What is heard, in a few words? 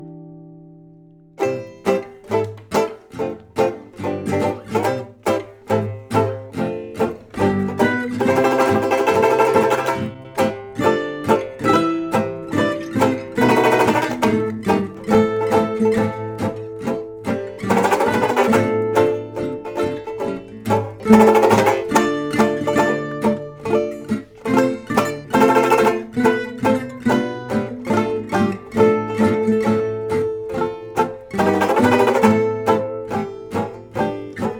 banjo melody; djangobooks hot club colab